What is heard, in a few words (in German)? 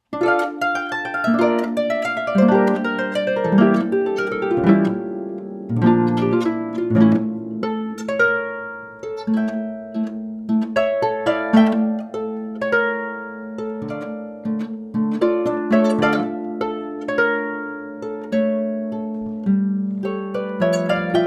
tango